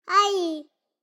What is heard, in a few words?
BABIES TALK Boy 3 months Iii Reverberant; Baby Boom